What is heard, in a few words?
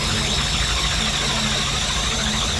Opal Charge Loop